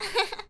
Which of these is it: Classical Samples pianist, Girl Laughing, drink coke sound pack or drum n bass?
Girl Laughing